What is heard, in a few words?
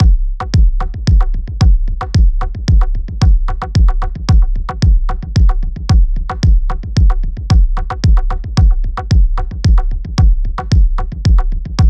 danz no snare, grooves